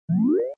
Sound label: UI Close